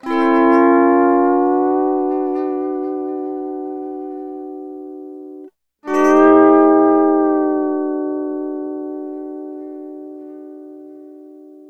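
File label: Sunrise Guitar Chord F# Dry, Lounge; Zero-G Kocktail Kollection; Construction Kitz (Lounge); Sunrise Mixes 082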